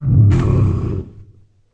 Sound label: devil scared